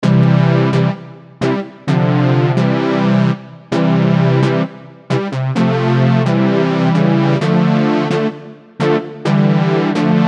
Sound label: 80s brass